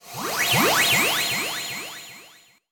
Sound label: bonus; Pornstar Pinball